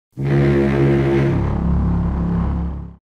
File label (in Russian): gudok; cherno alfa